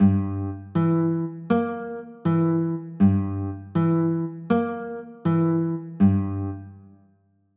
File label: g dim, chords; g dim7